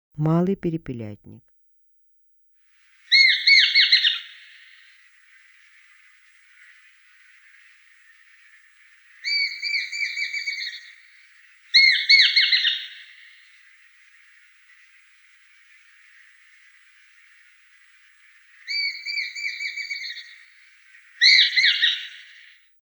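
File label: perepelyatnik golos, sparrowhawk voice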